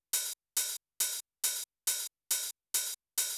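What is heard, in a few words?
open hi-hat, Techno 138 BPM; Best Service ProSamples vol.54; AKAI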